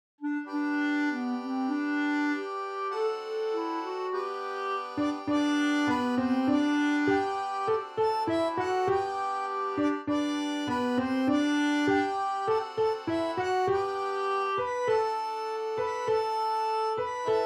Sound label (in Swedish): In Hafen Fun Haifa melodi